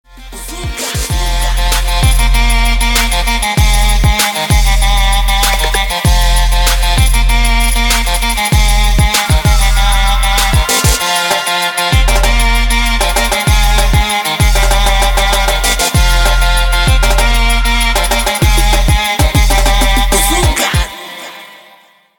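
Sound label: TRAP ringtone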